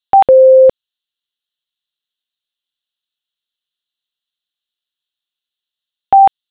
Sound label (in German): Loeschtonfolge; Signaltoene